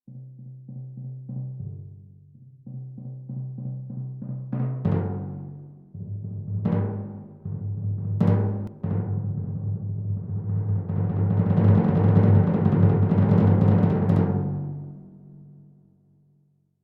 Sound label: Timpani; XV-88